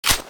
clipin; skull3; weapons

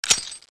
clipout; ozwpnset2; weapons

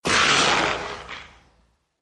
zombie infection female

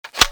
m60e4 clip in

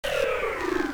railgun charged finish

